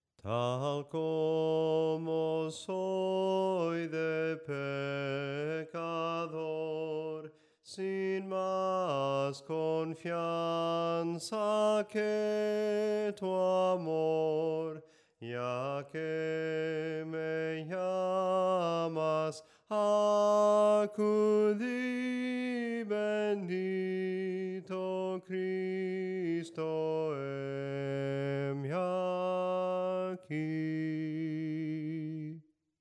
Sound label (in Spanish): SOPRANO CANTADO; TAL COMO SOY